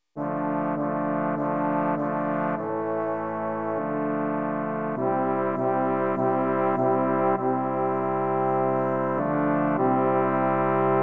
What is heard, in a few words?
In Church